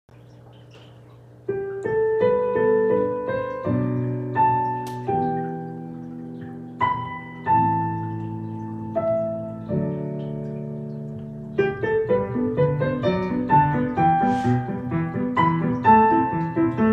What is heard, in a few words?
Piano only; Winnie Puh